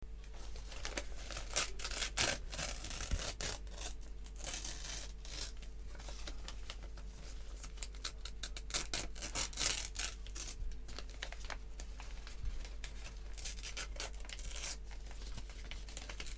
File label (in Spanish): papel reloj